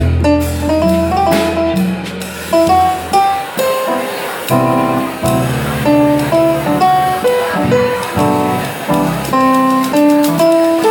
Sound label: Jazz Trio